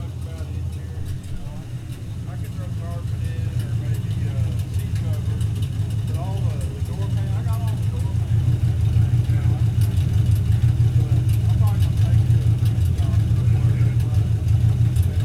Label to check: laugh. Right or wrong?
Wrong